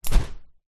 clothes belt leather swing air whoosh buckle noise; foley